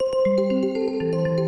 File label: original ringtone